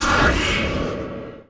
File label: Magic Circle Attack; battle item